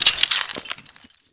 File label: evac player latch; Modern Warfare